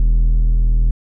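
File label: ambience shield generator exterior loop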